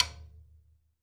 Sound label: FTOM TAP, drum